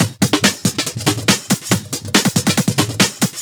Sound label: Breakbeat 140 BPM